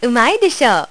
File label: ouch; 3D Klondike 1.0a